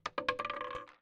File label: rolling short